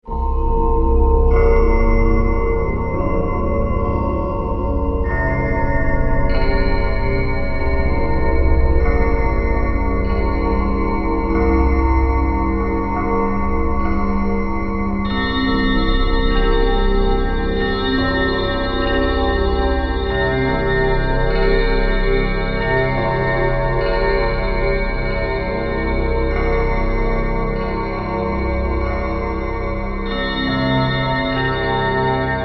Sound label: Clock, Chime, Synth, Musical, Long, Bed; 5.1; BlastWave